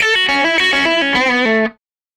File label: SG Guitar Loop